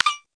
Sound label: skull bounce